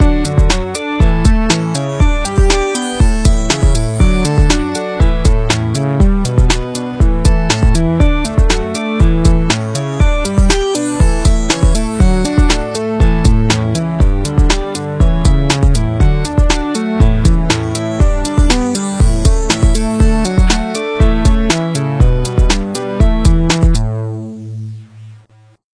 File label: Arp Magic 120